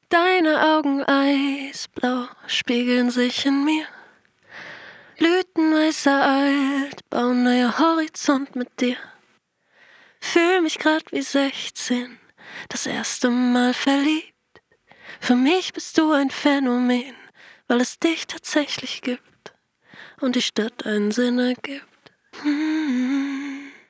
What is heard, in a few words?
Vocal Dry